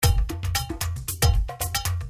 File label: Tabla Groove 115